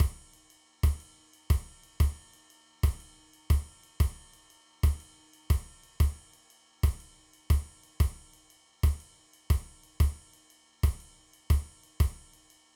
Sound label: Reggae Beat, drum